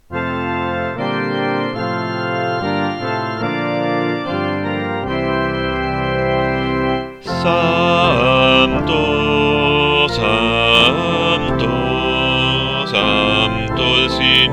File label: base BAS, coro; BASI CORO; Santo